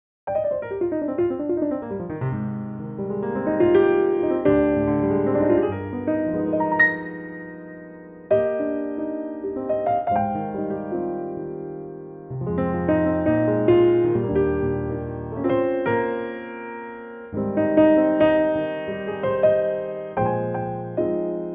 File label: piano